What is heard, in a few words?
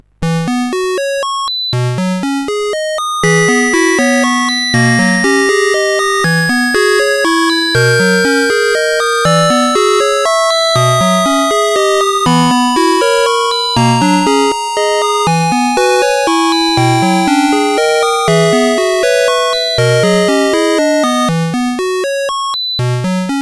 Musicbox, sid CSG8580R5